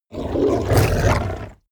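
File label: hellhound gnarl aggressive, creatures; kreaturen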